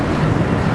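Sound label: door close metal garage loop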